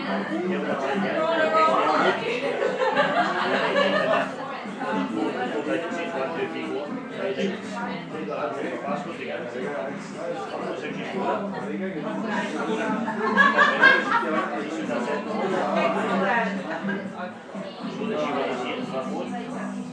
pub ambient sounds edinburgh scotland

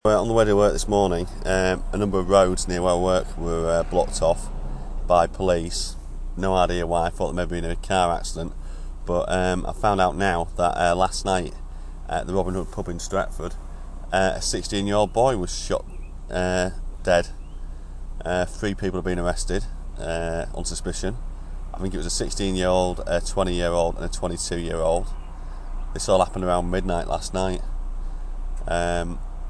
shooting

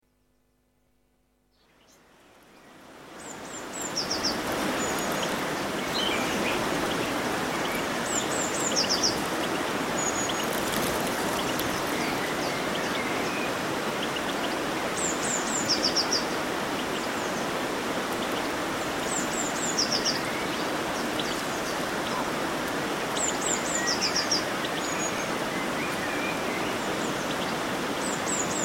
birdsong at river peris in stereo

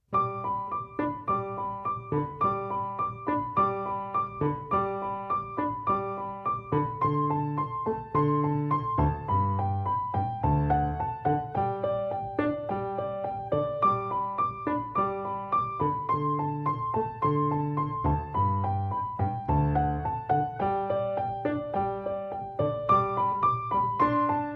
violins dragonflies; yr 2

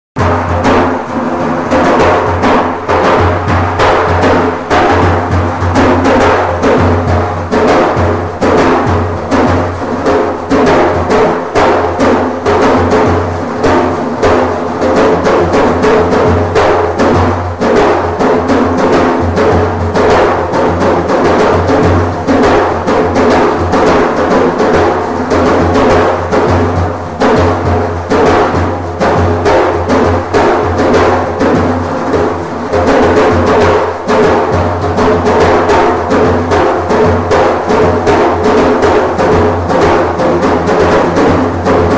on the daf Persian drum; asia house

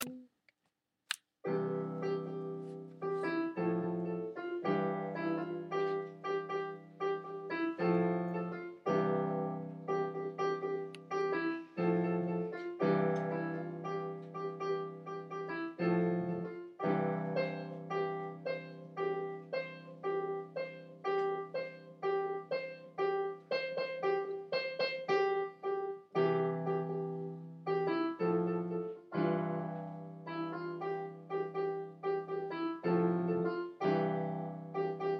piano solo

